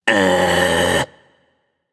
tongue; smite; apollo

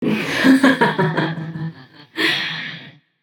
laugh; smite; voicepack; persephone

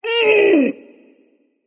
ouch, voice; Smite; ymir skin14

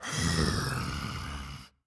grunt; fenrir

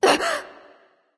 surprise; smite; voicepack; hel

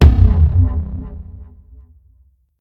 taiko low; smite; raijin

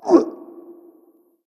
hitreact human male small

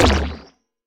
staff hit; smite; ahpuch skin10

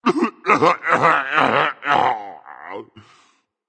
boohoo berserk; cuchulainn

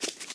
rabbit; smite; medusa

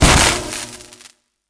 passive hit; smite; raijin

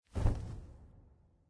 flap; smite; kukulkan

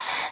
Breath In; smite; god bakasura

